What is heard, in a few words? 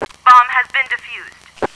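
bomb defuse; cstrike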